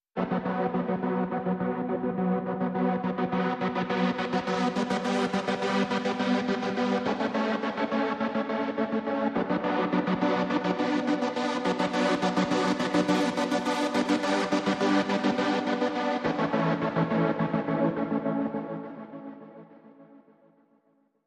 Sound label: Pulse Only